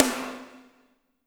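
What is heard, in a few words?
piccolo snare; Spectrasonics; Burning Grooves CD2; PICC. BALLAD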